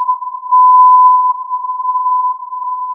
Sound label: filtered input